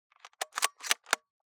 M1903A3, Handling, Cycling Bolt, bolt-action rifle; Springfield; MKH416; Pole Position